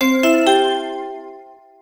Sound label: three tone bell; portofseattle; seatac